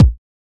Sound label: Kicks